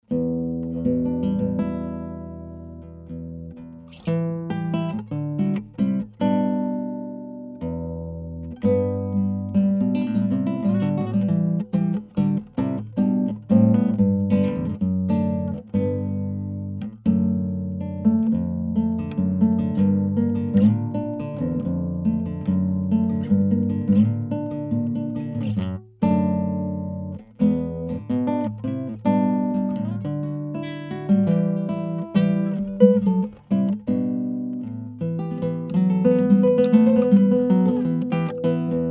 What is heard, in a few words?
nylon string dry